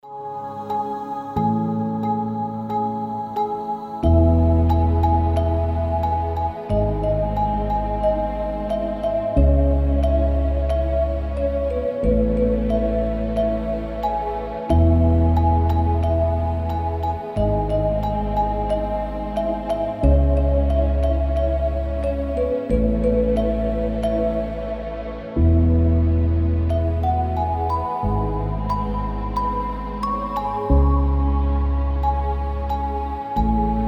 lullaby rock

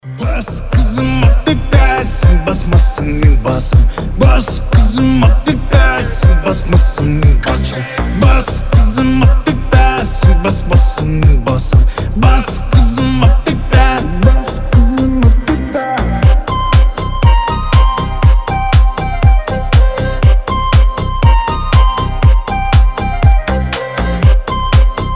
bass

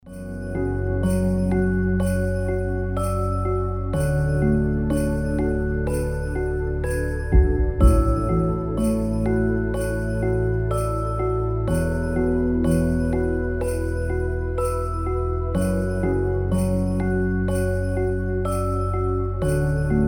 lullaby

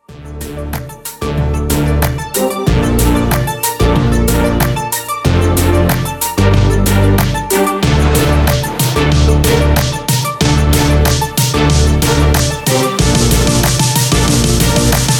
bass

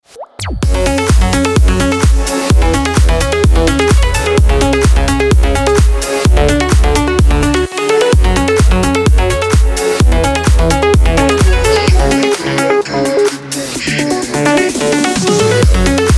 emotional

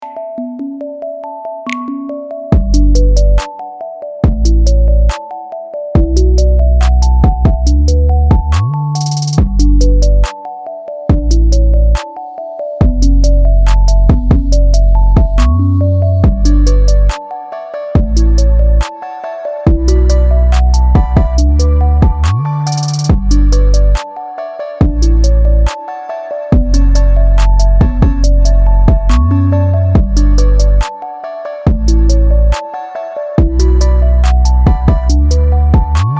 instrumental

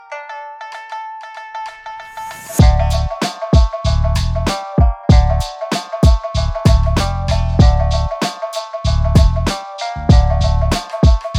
japanese underground boom bap beat

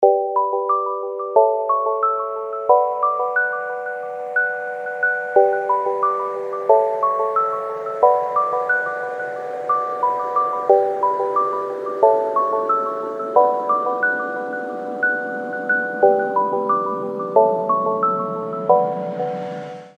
lullaby gromkaja melodija

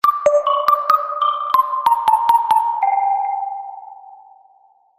sms

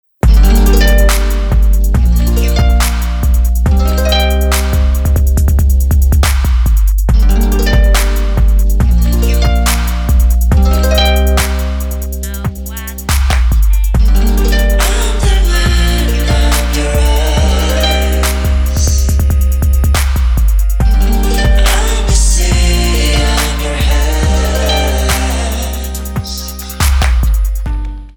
harps and landscapes

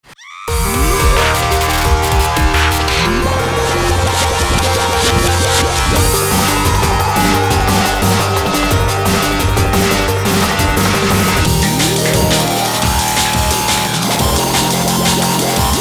psychedelic goa trance

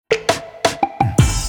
sms

